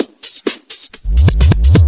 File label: Minimal Fills, Minimal House; Minimal Loops